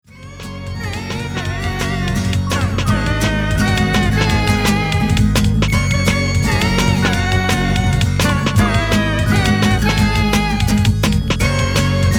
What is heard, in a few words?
Pop Upbeat Song